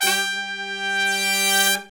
BRS LONG, R&R Horns, Sect; Roland L-CDX-03

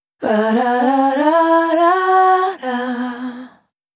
Dry, Multi-Voice Loops, Vocal Samples; Piriform; Sonic Foundry (Sony Creative Software) - Pocket Diva World Class Vocal Samples

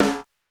Sledge, Beyond Snares; Airwindows

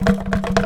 G2 ANKLUNG, JAVA ANKLUNG; AKAI S6000 CD-ROM; WorldSounds

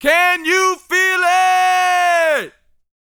VOCAL, R&B Dance, HIP-HOP; Spectrasonics Vocal Planet

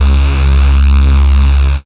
bass C#, Rave Bass, Dance Synths

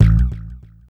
BASS, Hip Hop R n B; USB Soundscan vol.19; AKAI

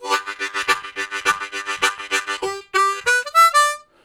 Chuckaticka, Harmonica; Zero G - Harmonica

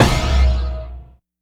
Synth Stab one shot; Sonic Foundry (Sony Creative Software); Electronic Point Blank

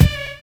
SLOW; Univers Sons

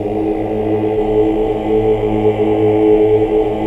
OOH OCT

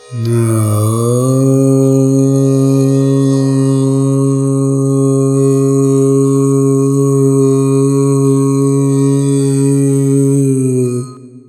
Alaap, Vocals Male, World Pop; Sonic Foundry (Sony Creative Software)

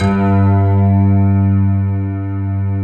PNO HEAVN; EDENPNO

